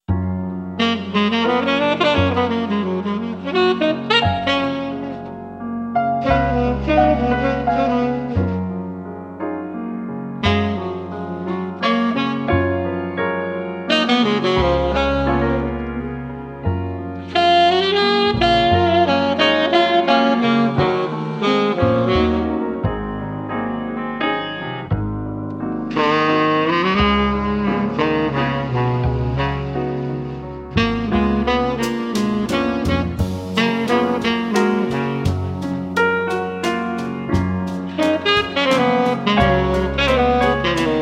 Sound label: In F-Sharp Major, Jazz Ensemble